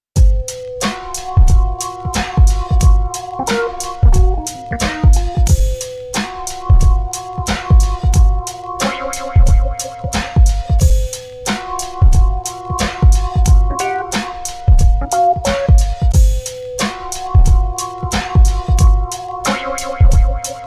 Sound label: hiphop; xp-80; roland; Multi-patch demo; roland demo cd-3